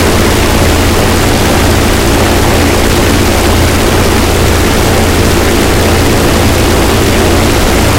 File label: engine bomber rpm close; War Thunder; engines